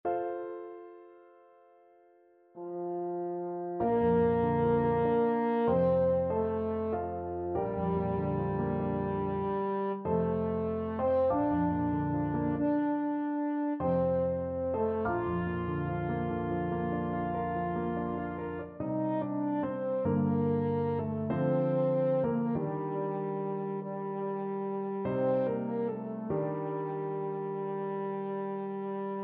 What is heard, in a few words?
french horn